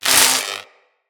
Lightning Rifle Spark; Weapons; Corpus; Chain Lightning Rifle

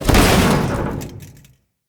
Internal Ship Damage; Vehicles; RailJack; RailJack Hit Effects; Railjack Hull Hit Effects Internal

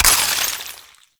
Ammo Pickup Cold; Ammo Ability; Warframe; Warframes; Frumentarius

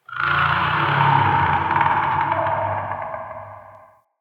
Root Cave Root Creak Large; Ambience; Neathuns Root Cave

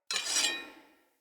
Sword Equip; Weapons; Player; Swords; Fenn Jotar Sword

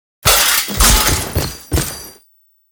Riposte Nikana Finisher Foley; Enemies; Warframe; Duviri; Dax

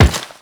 Step Metal, Footsteps; Warframe; The New War; Characters; Kahl

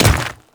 Dirt Step, Footsteps; Enemies; Warframe; Grineer Royal Guard

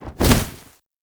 Bird Of Prey Wing Flap Down; Warframe

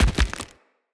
Mockery Body Fall; Enemy; Foley; Mockeries; Characters

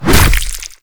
Tentacle Swing Hit, Proto Infested Ancient; Warframe; Abilities

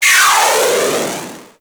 Inferno Comet Proj Whiz; Warframe; Ember

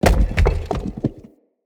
Warrior Statue Bust Drop

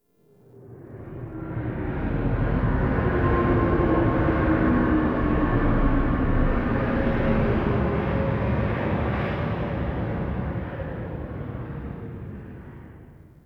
Ship Flyby Delay, Dropships; TheTeacher

